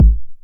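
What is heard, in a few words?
909 BD tape, kick drum; Goldbaby Tape808 & Tape909; The Tape 909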